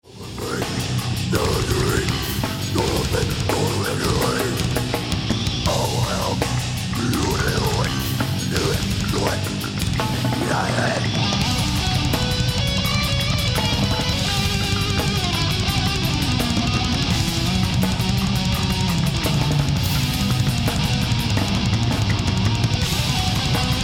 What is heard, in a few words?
solo